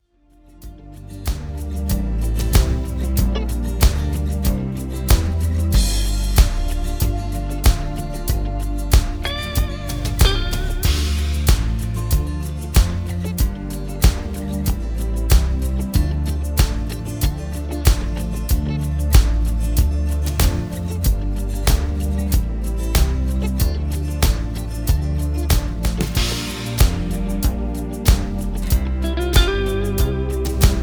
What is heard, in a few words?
Instrumental